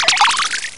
Power; hydra castle labyrinth gamerip 2011

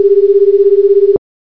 call; misao

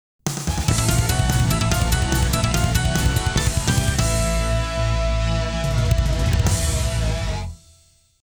Game Over; final fight cd scd

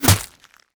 bullet impact ice; Infernal Radiation

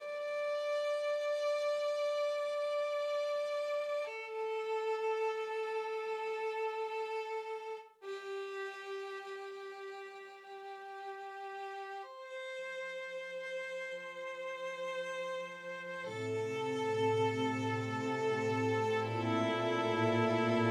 Loop Sad; the walking dead michonne

